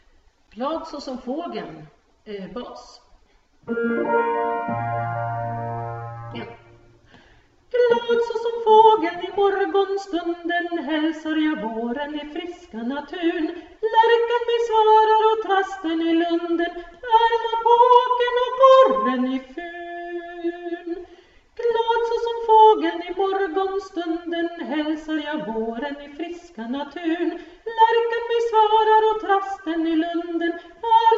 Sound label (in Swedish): Glad sasom fageln bas